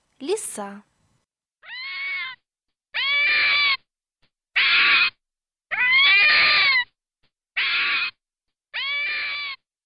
Лиса

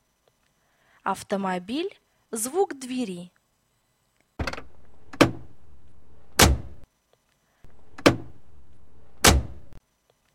Автомобиль звук двери

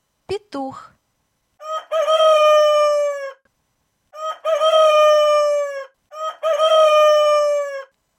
Петух

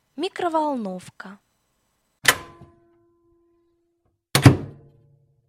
Микроволновка

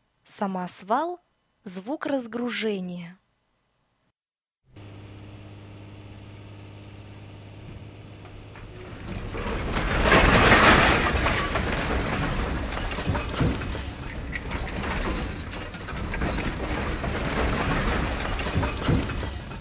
Самосвал звук разгружения

